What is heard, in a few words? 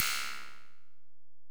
EPerc, Alesis DM-PRO; 300 Drum Machines